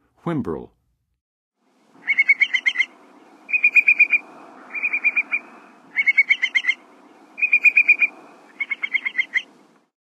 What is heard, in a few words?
Whimbrel, Birds of North America; Western USA; Western USA Songbirds